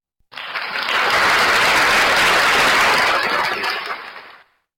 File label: applause